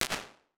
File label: 0.6s Palace Room, Impulse Responses; Small Spaces; Indoor Spaces; Apple